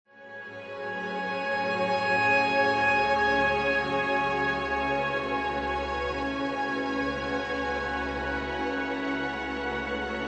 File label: phasing